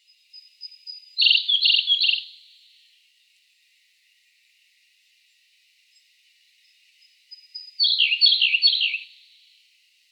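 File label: siniy solovey golos, Siberian blue robin voice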